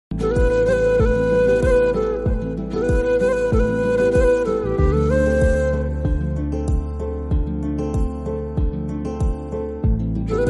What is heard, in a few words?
Flute Version, Instrumental; Jawan